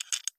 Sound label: screw Manual